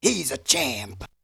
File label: Vocal; ZERO G - Datafile DISC 3